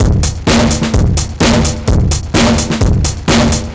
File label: Old Drums 128; musicradar